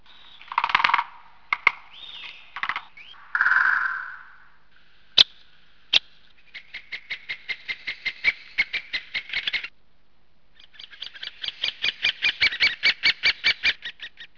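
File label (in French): pic epeiche, chants d'oiseaux; sons nature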